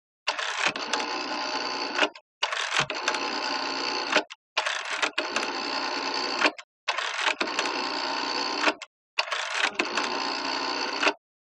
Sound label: Phone Dialing Rotary, foreign